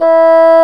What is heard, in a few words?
Bassoon; CMI